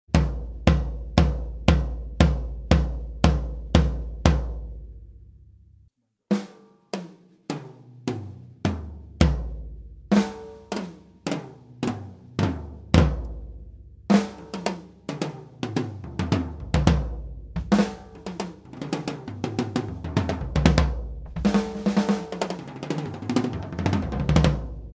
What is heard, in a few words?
C414 Standtom